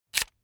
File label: electric drill template slide out medium; maschinen; bohrer; soundpool